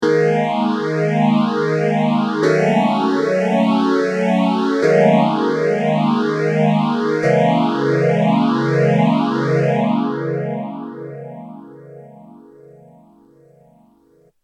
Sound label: m1000 boss ph3